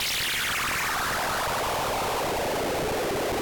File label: Analog Screech